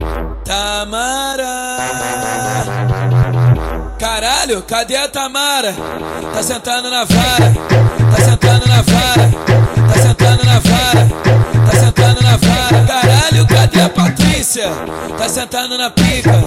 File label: funk bass